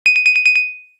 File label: Cup, notifications